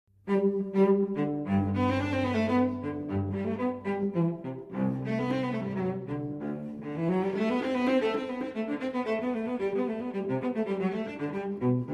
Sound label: Cello Suite in G Major Courante; Cello Suite No. 1 in G Major, BWV 1007; III. Courante